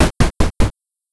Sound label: footsteps